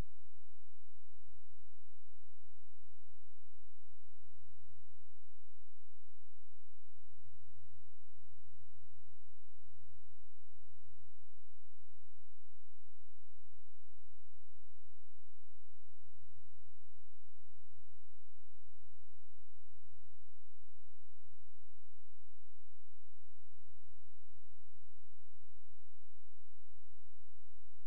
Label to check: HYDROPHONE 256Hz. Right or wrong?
Right